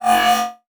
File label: Multimedia Internet CD-Rom Flash Beep Low Pitch